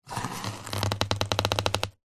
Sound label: foley cardboard box lid flaps rub scrape together